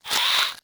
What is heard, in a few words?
Spider Zombie Transform